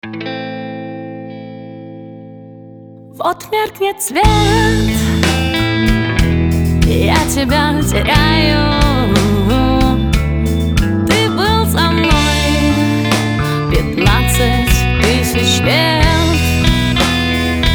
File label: blues